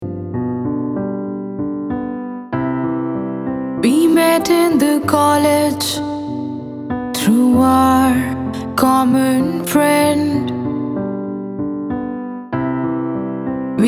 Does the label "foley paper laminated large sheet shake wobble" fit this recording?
No